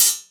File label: studio open high hat sound b key, Royality free open hat sample tuned to the B note.